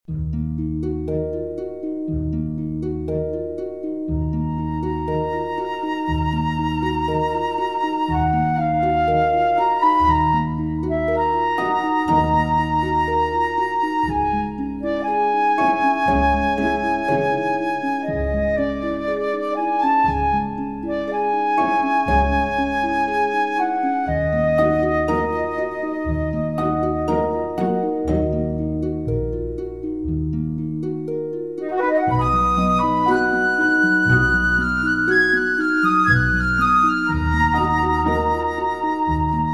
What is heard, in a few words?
flute